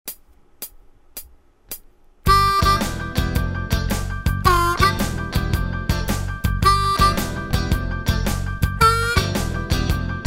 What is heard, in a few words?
Easy Lesson Blues Harp, Harmonica; Teach Yourself Blues Harmonica - 10 Easy Lessons; Peter Gelling